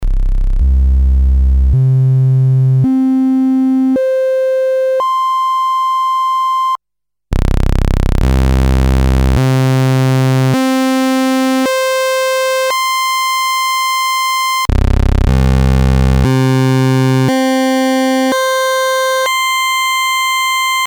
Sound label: osc1 waves, korg ms20 synth